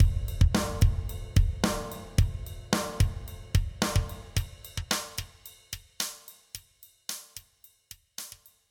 drums focusing eq passiv sweep low to high; softube passive-active pack testbericht

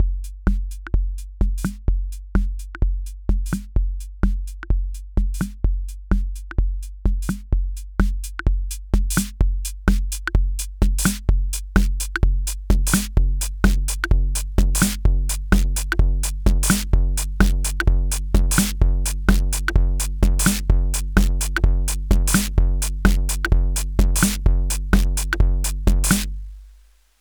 akai rhythm wolf howl

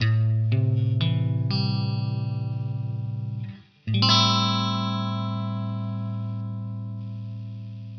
A minor7

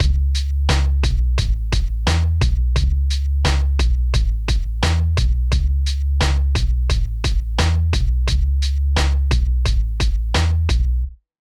snare kick hh

